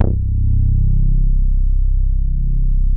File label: VCO bass C2; SE-1 BASSES